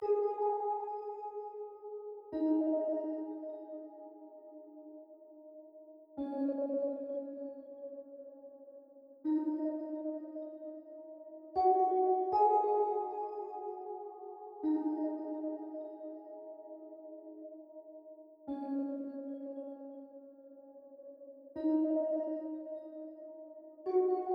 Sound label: 156 BPM G# Min Bell; Cymatics; Quest; Destiny Gold Expansion